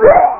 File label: Retch; Project Asthma v1.0 Demo